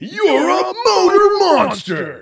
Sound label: win